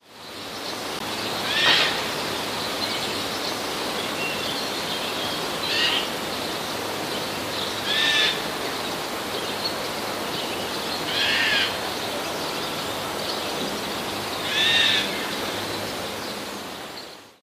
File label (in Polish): sojka; rodzaj dźwięcznego skrzypienia